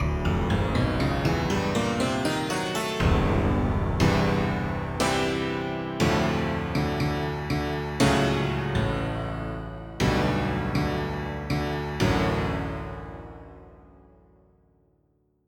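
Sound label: Hammered Octaves, dulcimers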